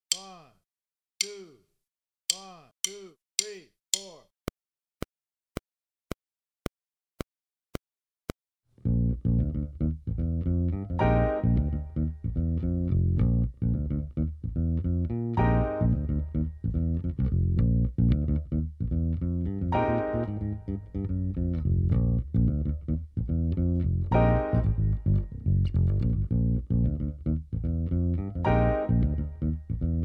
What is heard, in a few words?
Pop, Drum Fills; Essential Drum Fills; Erskine